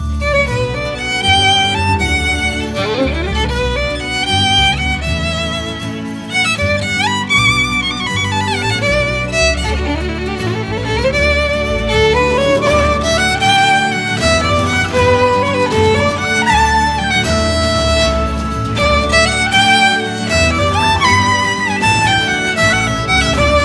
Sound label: epic cinematic